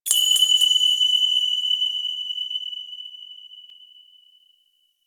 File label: Hand Compass Objective Notification; Ambience; Duviri; Warframe